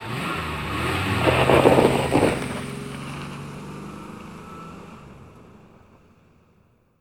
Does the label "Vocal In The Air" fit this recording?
No